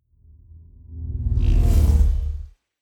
sci-fi vehicle train drive by hum; raumschiff

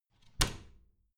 bathroom cabinet door creak close heavy

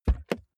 car bmw e46 interior door front open fast; Cars

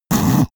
sniff back; koerpergeraeusche; menschen; soundpool

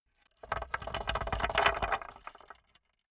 small metal rattle on wood; industrie wirtschaft; metall

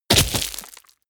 body fall gravel heavy; krieg-waffen; nahkampf; soundpool

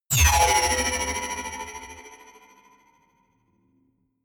sci-fi magnetic sling big hard; energie

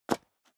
step high heels concrete grit single stair up; schritte; Footsteps; menschen

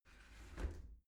shower slide door close hit soft

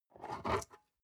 storage lid slide open soft, box; objekte

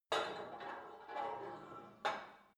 put weight onto barbell, fitnessstudio

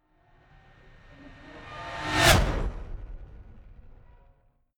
fly by hover car hum high

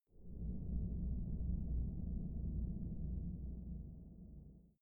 space incoming dark matter; atmosphaeren umwelt; weltraum

